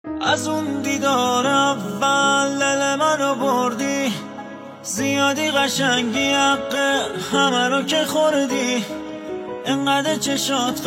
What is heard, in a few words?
Piano Version